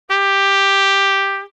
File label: trump g adsyn